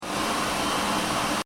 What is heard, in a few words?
Hand dryer on, Hand Dryer: Automatic, Public Washroom, Switched On; Miscellaneous Electronic; Electronics